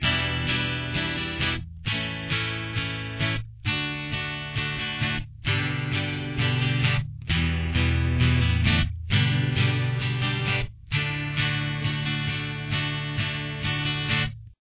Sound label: solo rhythm, guitar